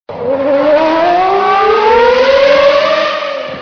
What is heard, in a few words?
car add